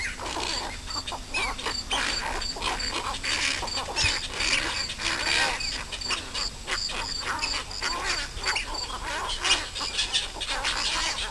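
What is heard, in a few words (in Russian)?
egipetskaya caplya golos, cattle egret voice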